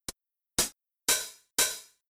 House HH, Rhythm

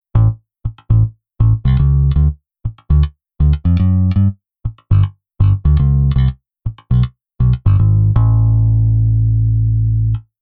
Bass 16 Just

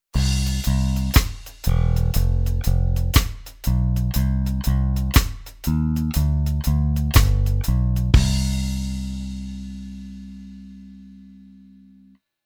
Bass Arr Reggae

